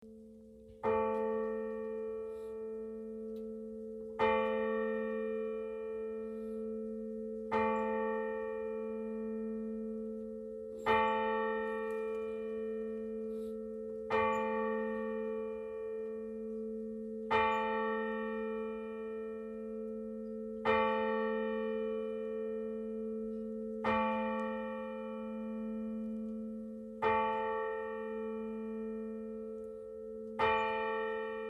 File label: Monastery bells